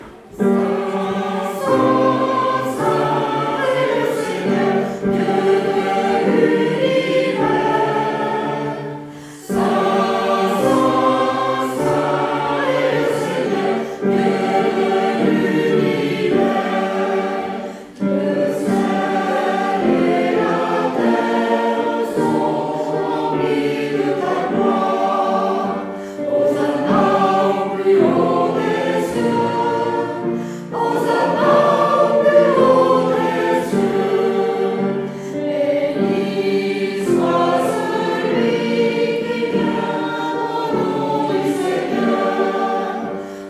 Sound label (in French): Sanctus messe de sainte cecile Tutti